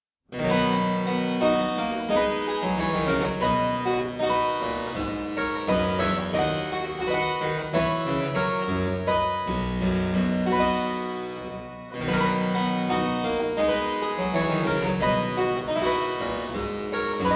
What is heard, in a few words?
Aria in C major - Variatio, harpsichord